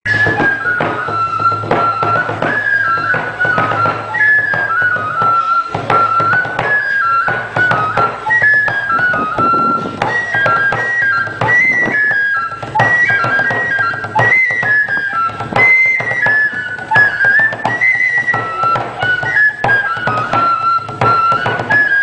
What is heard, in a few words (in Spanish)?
Musica Chirimia; Dia del Rosario de San Antonio Yalalag